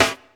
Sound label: raw snare e key